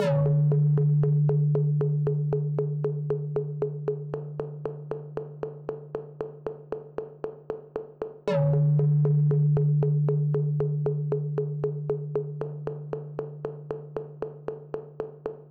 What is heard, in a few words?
drums